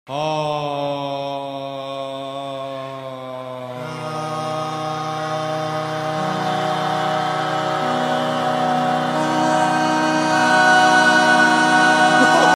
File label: Harmonizing